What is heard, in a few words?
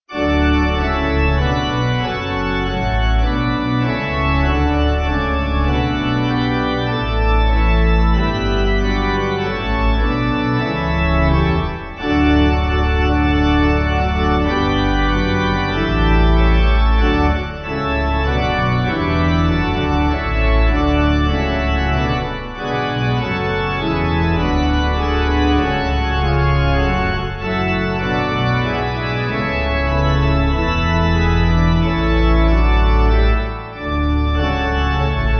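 Gloria Pipe, Pipe Organ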